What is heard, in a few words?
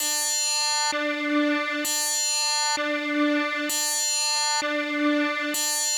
kawai k1 OMNIBUS LOOP; wavetables